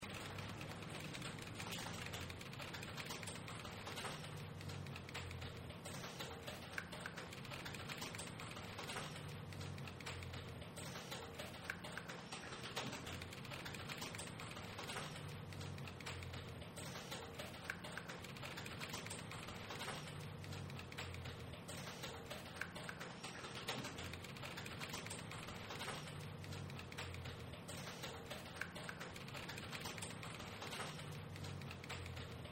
OFICINA CON TECLADO DE COMPUTADORA AMBIENTE

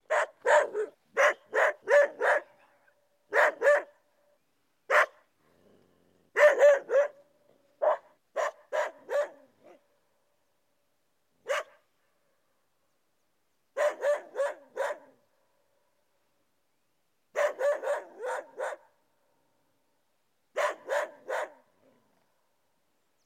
PERRO EN PUNA AREQUIPA

